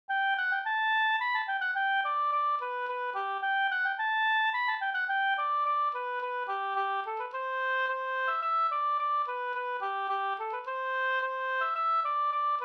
slängpolska

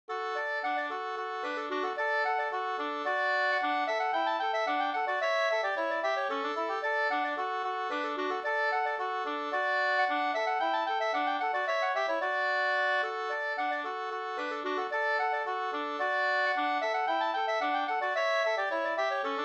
polska Blekingepolskan; e Viksta-Lasse 2st

